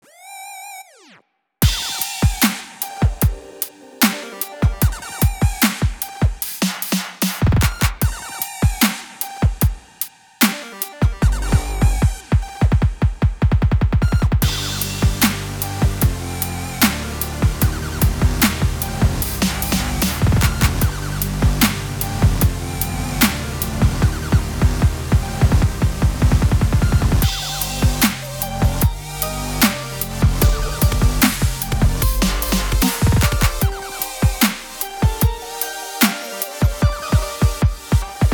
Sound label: Beat